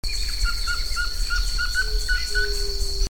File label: Piprites chloris call